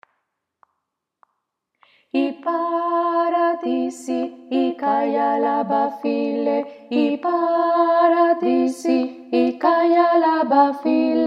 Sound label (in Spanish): altos 1 y 2; ipharadisi